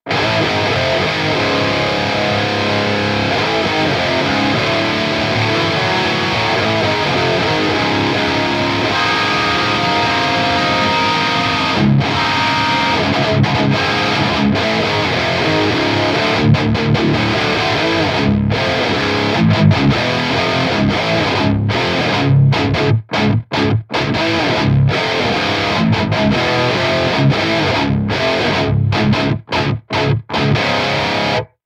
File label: peavey 6505